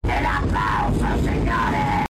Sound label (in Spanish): el aplauso